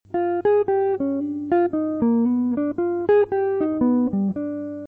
g7 phrase

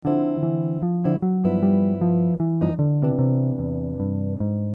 chord

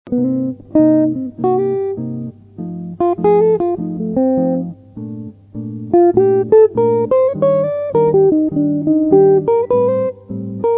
autumn solo

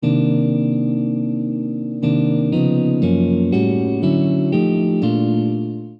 coltrane change

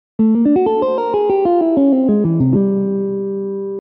Arpeggio

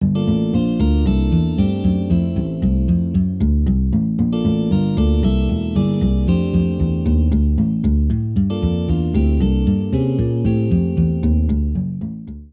chord

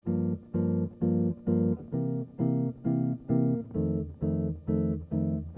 blues

